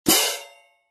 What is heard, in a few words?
18in Z MAC Pair, cymbal sound